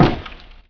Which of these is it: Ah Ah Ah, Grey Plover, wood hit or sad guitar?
wood hit